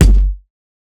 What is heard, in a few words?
Kick, Dance Drum Kit; 99Sounds x Monosounds; Twilight